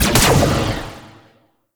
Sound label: Shot; Warframe; Corpus; Spear Gun; BE Ferrox